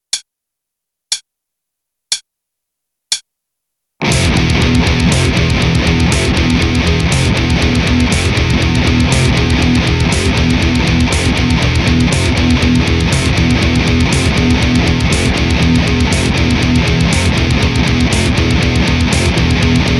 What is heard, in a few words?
heavy, bass; jens goers